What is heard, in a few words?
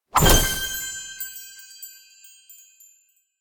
small Win; asgardian stones